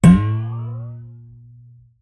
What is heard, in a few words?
BOING Bowl; Cartoon & Infotainment; SmartSoundFX